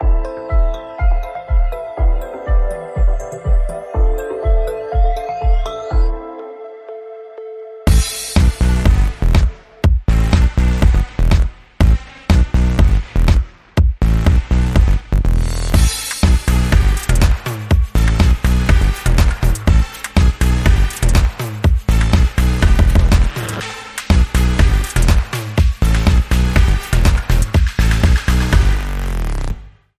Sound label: Bouncy Bites